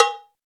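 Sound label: Cowbell, Roland R8 MKII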